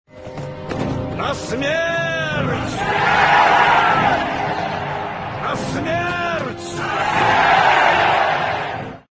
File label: event screams; Minecraft; Votive Content